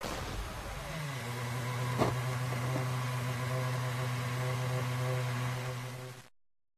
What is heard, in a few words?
ferrari